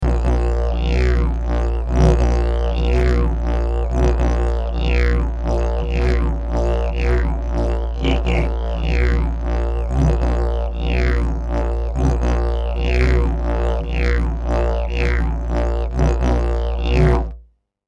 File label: didjbone lunge bb